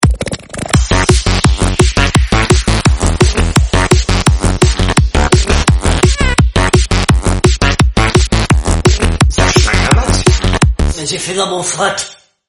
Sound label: fart tekno